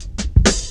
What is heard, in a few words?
Drum Loops, Jungle